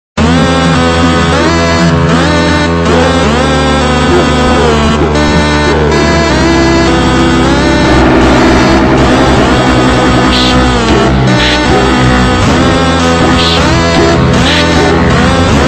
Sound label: slowed reverb